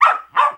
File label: dog barking